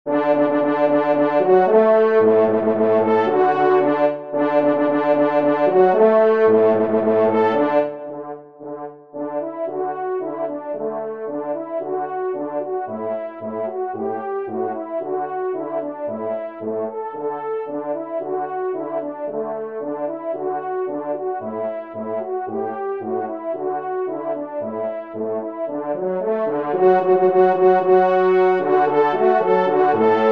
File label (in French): trompe; Gais Sonneurs; Victor Viney